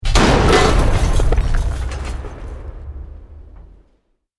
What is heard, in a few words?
BUILDING COLLAPSE SCAFFOLDING SMALL; Created dramatic effects of buildings collapsing or breaking; Construction